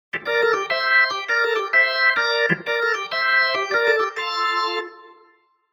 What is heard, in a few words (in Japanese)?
燃えるオルガン